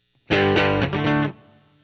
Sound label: GTR OD